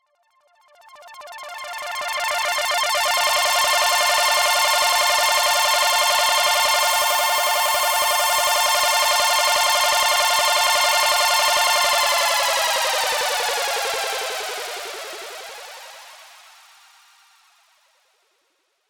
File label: analog arpeggiation